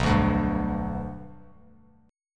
B Minor Sharp 5th B Bass Standard, chord; Bm#5 strummed; 7,5,0,0; JGuitar

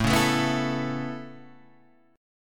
A Major Add 9th A Guitar Drop D, chord

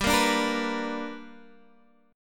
B Minor Major 7th Sharp 5th

